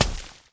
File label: explosion snow; Epic Toon FX